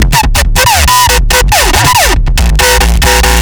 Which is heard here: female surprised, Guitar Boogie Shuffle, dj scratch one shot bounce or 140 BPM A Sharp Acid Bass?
140 BPM A Sharp Acid Bass